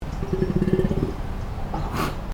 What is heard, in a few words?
takin vocal